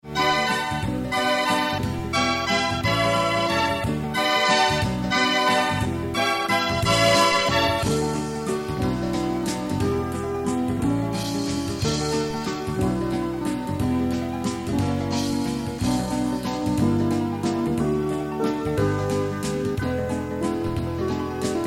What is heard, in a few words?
melodiya; muzykalnaya skazka